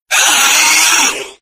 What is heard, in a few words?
mannequin scare; kraven manor